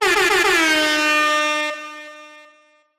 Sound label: Air Horn; Fortnite; emotes chapter 1